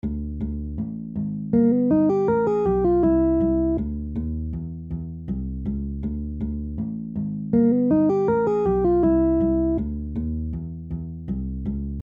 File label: major 2 5 1